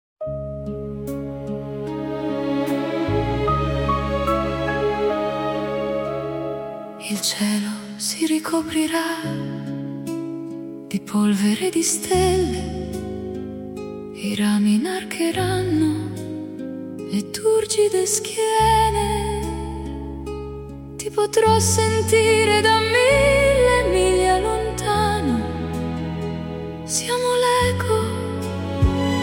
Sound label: italian version